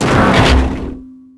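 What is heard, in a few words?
Valve Open; Finding Nemo; XBOX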